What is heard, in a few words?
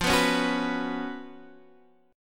F sharp Major 7th Suspended 4th